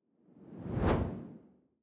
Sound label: whoosh simple low; swooshes-swishes